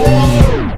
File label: HIT, Hits & Brass